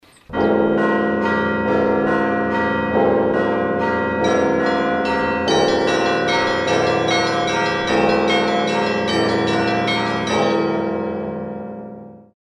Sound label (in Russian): kolokolnyi zvon